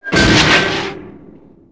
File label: plan hit; planecr